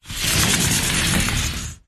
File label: household sliding window slide open or close; zapsplat